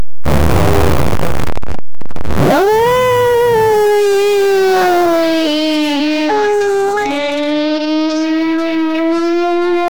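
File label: simple arcade explosion, retro games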